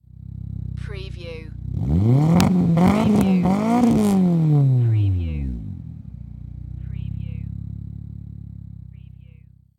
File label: MITSUBISHI ECLIPSE EXHAUST MULTIPLE REVS